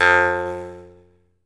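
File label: Jaw Harps, Pitched Percussion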